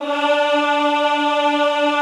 EHH D3, Classical Choir; Best Service

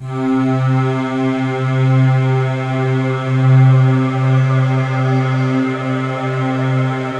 3D Keys; 3D Sound - 3D Keys VOL-1

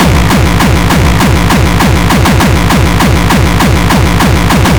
Hardcore Kick

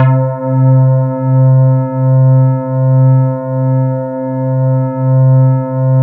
E-HARP, DX HARP; Wizoo - Powered DX

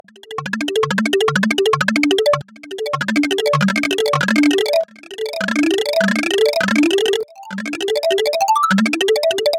E Seq Speedy Arp; Zero-G; Future Wave

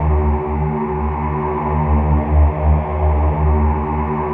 Synth Chords; Eclectic Electronica

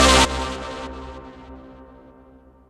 Stab C2, Club Techno; Zero-G; Stabs

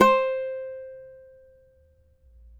CAVAQUINHO C, Guitars

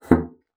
BASSOON; Xsample VOL-6

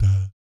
DAT, Vocal Jazz FX; Spectrasonics; Vocal Planet; JAZZ COMBO

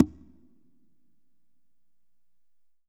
Congas Wet

